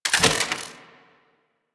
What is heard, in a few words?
Shield Unequip; DE; Warframe; Weapons; Archwing; Melee; Arch Sword Shield